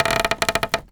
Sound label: Polystyrene Creak